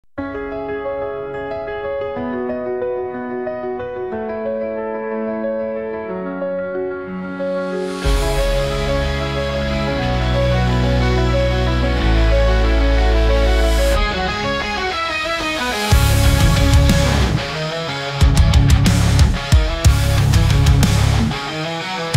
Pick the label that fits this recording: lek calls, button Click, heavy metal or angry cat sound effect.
heavy metal